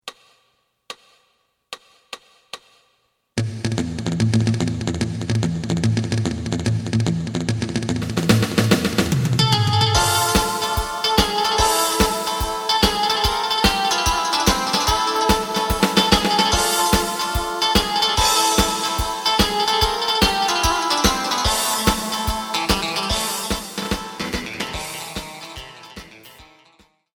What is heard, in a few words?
bass